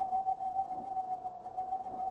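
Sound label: ambience holo sign; angel city; Titanfall 2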